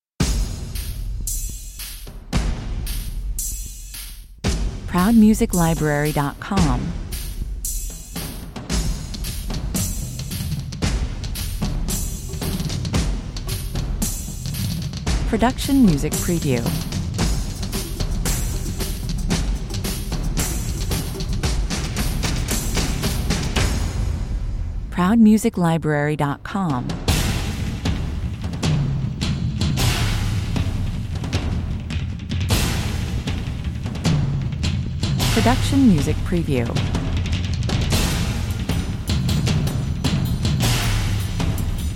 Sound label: percussion only